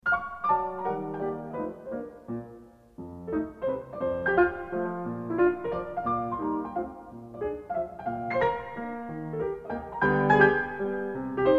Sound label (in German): Carnaval für Klavier; Wilhelm Kempff; Op 9; Coquette